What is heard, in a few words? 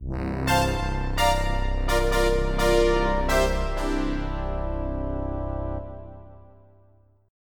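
synth brass; Roland - An Invitation To The Roland World Of Music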